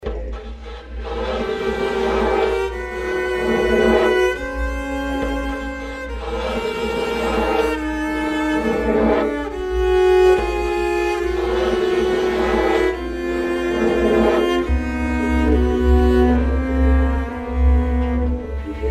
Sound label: Cello Palm